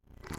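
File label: Timer Turn-Off Reverse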